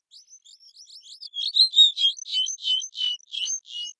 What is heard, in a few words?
isolation call audible